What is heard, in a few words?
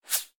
bullet passby; War Thunder